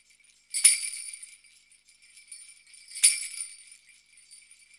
Modern Percussion Loops; Soundscan vol.56; AKAI

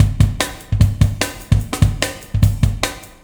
Warp Drive Beat, Processed Drumkits

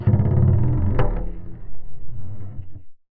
Marbles & Framedrum; musicradar; contact mic madness; Raw

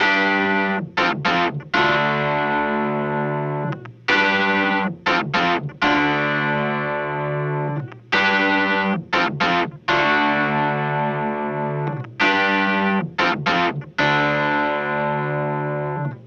Clavinet, 70s Analog Funk; Sonic Foundry (Sony Creative Software); What it Is!; Good Times

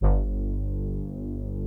Prophet 10 TALK; Greytsounds VOL-1

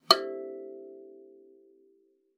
KALIMBA E5; Xsample VOL-7

